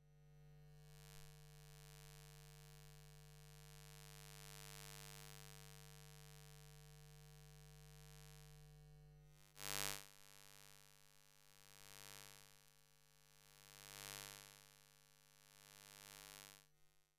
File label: Electromagnetic Fields, Hummin; electric fields